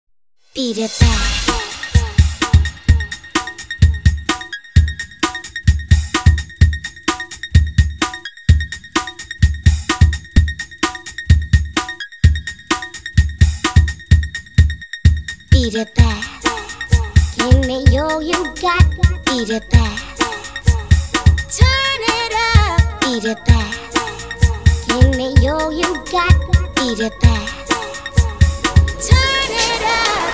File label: electro freestyle music